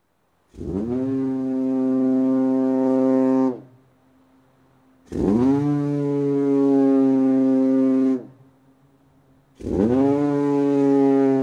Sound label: Fog, Whitby Lighthouse (close perspective); Weather; The BBC Sound Effects Library